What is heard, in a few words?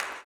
CLAP, Blues Soul; Spectrasonics Vocal Planet